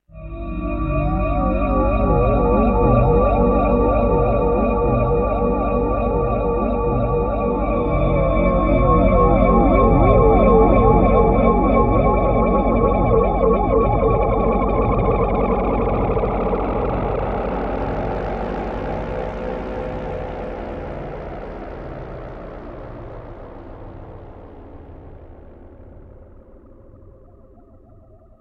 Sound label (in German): Mod Wheel Vibrato Int und Speed; Waterworld